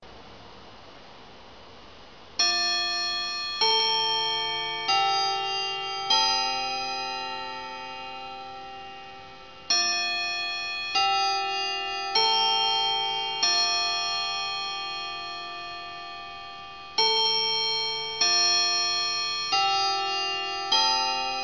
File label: Westminster, clock